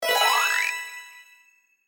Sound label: Puzzle game power up achievement